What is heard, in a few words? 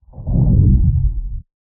underwater dive; soundscrate